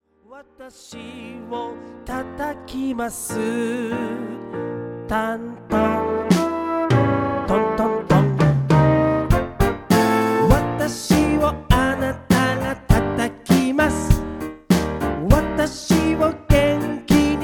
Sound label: Taiko